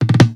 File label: tom rollup; Drums; One Shots; Slate; AURORA Sample Pack; Toms